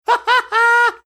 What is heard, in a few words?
cute laugh